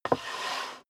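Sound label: step dress boots wood creak slide long, footsteps; schritte; menschen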